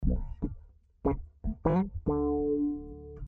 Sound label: E 149 Wah Funk